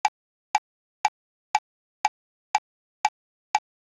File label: Impulse